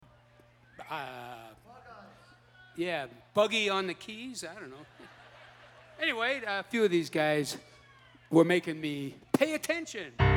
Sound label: Piano Moment